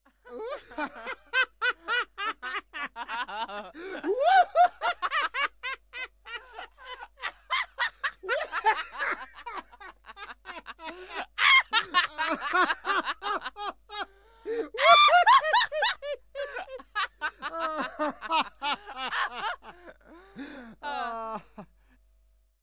Man and Woman Laughing; KMP Film Video Sound Effects

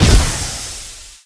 Gas Bomb Discharge; Destruction

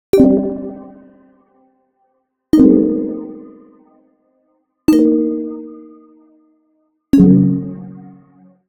Arcade Click Negative

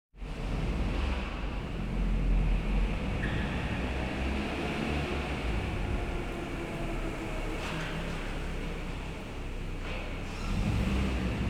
Automobile Arriving In Parking Garage; KMP Film Video Sound Effects

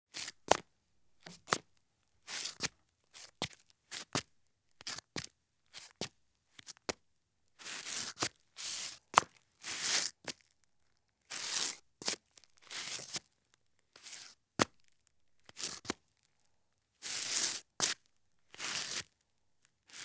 Flip Flops Do Various Scuffs on Concrete; Sound Ideas; Footstep Sound Effects

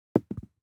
DICE on Felt, Throw and Roll, Standard, 1 One Die; Samples; ArticulatedSounds; DICE Sfx Party (Extended)

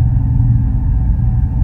Machine Generator Loop

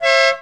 Auto Horn Foreign; Automobiles

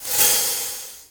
Pickup Magic; SFX Kit; Pickups

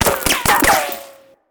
Bullet Ricochet; Sound Ideas; Guns

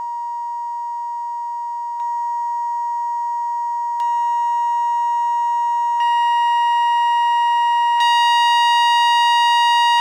phone howler; devices accessories utilities; NS Studios - ultra sound collection

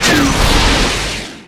Rocket Launcher Shot; SFX Kit; Weapons

